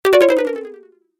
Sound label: Falling Fail Fast, video game